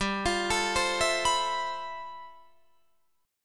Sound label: G 13th G Guitar Drop D, chord